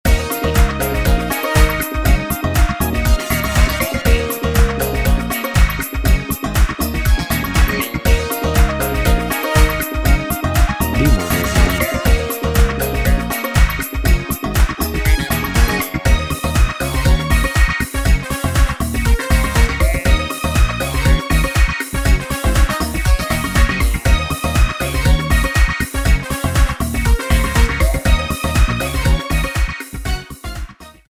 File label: Rock Pop